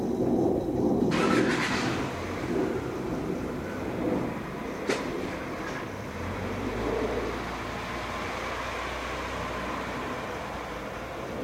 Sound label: this is how our mail carrier s car sounds every single day